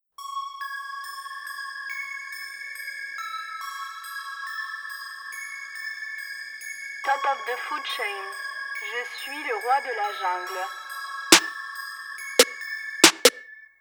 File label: Instrumental